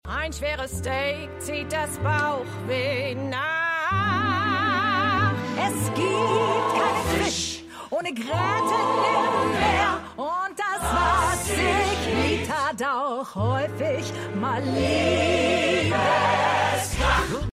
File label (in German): Frau Gesang Frau Gesang; Schmigadoon